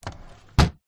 household window old wooden close queenslander australia; zapsplat